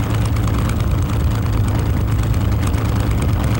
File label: Plane P40 Idle Loop; Airplanes